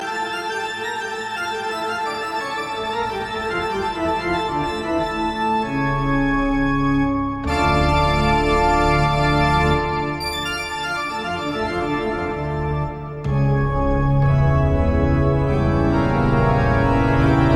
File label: More Castle Music